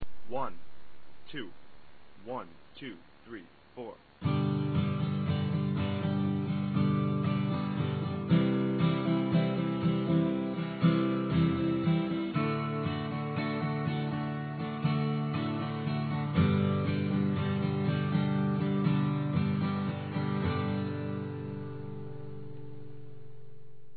strum, guitar acoustic

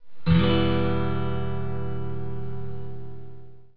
em chord, guitar

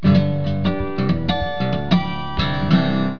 harmonic, guitar

